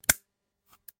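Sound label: piston snapped into place, syringe; porshen vstal na mesto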